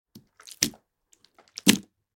Sloppy food squish baked beans; cooking

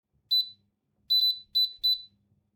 Microwave button beeps; appliances

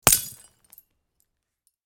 Lightbulb break shatter explosion; torch sound effects

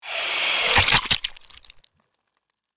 Vampire bite aggressive attack

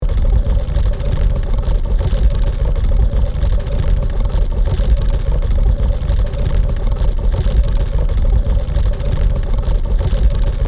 Machinery gears rattle loop; gfxsounds

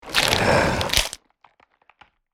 Werewolf fangs growing transformation

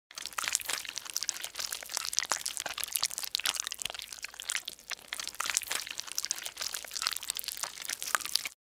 Cartoon slime bubbling wet gloop movement

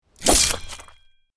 Knight sword stab and gore

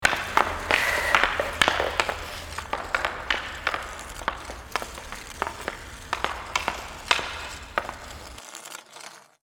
Ice hockey stick puck handling

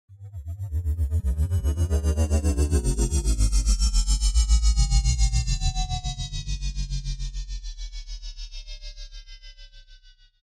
Spaceship pass by alien craft

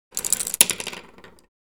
Paint brushes setting down on the desk

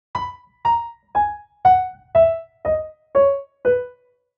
Piano B major descending scale

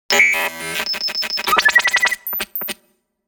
Medium glitching noise burst

